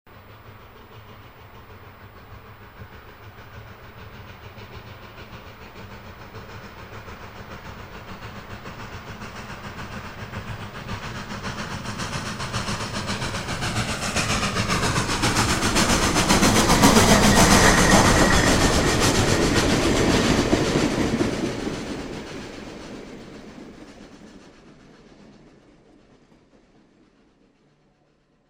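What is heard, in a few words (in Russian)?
паровоз проходит мимо; звук паровоза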